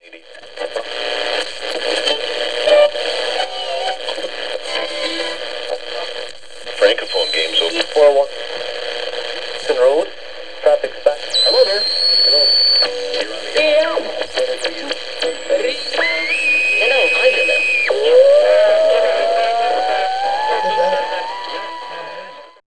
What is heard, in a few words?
RADIO